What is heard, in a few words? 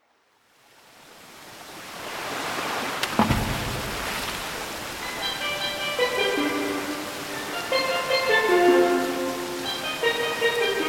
Soundscape Version; Zora's Domain; The Legend of Zelda Ocarina of Time